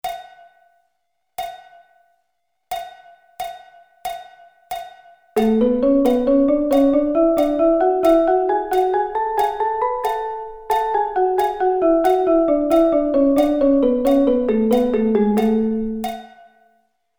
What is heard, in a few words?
A Double Sticking